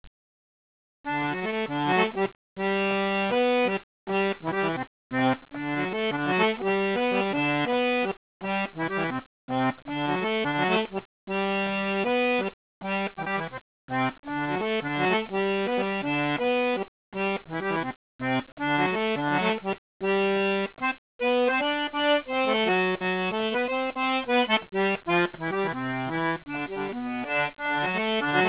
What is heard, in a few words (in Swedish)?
mazurka normaltempo; Syvers; Arkivinspelningar